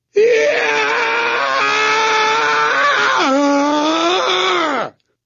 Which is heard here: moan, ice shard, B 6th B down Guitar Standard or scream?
scream